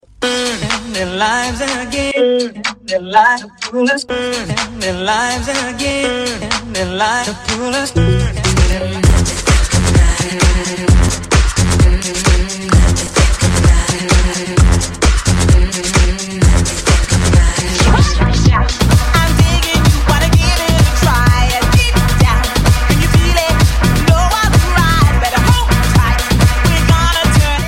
House Mix

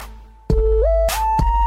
New Small Sms Cute

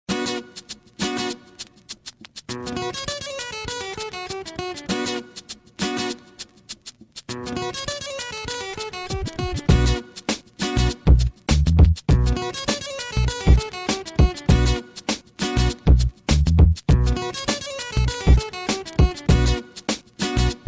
Cool Melody

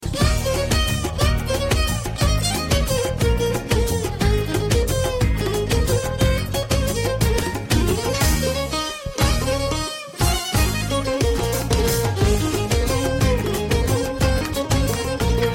Afghan

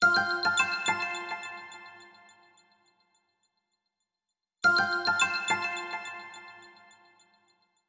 Quietly Tone

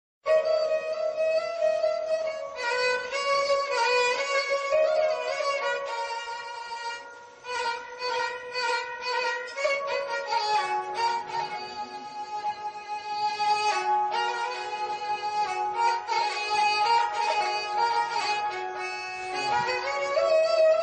Cooooooooool Sarangi

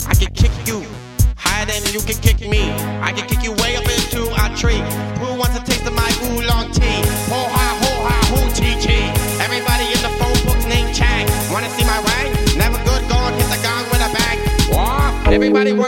Chinese Rap

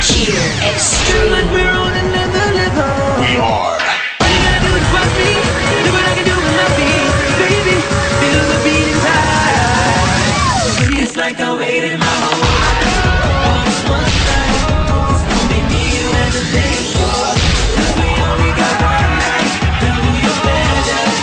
Cheer

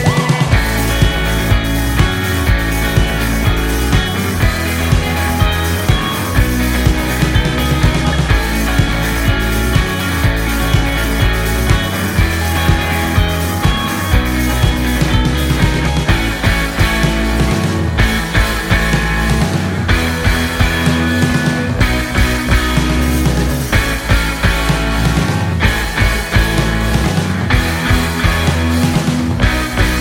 Guitar Solo

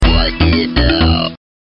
Bump Msg Tone

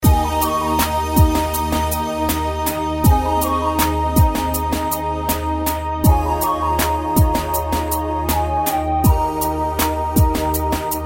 Ringtone

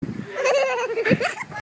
child laugh

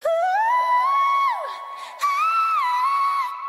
Vocals

